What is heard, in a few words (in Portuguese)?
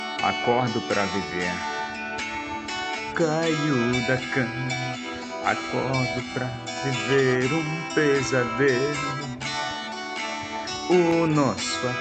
saxofonista, musica autoral